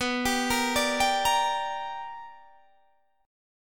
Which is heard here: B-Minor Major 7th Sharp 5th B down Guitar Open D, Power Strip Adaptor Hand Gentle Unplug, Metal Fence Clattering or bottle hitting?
B-Minor Major 7th Sharp 5th B down Guitar Open D